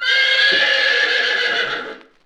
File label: horse; Animals